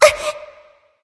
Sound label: surprised; smite; voicepack; scylla